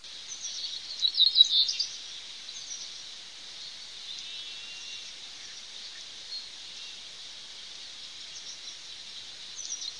ZIGOLO CENERINO

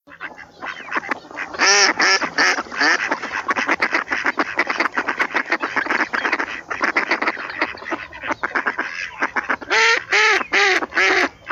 GERMANO

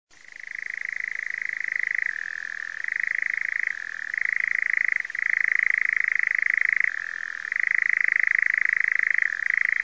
SUCCIACAPRE

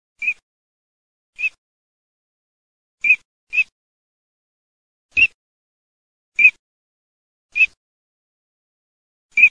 ALZAVOLA

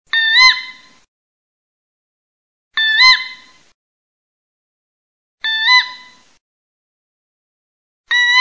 CIVETTA bosco

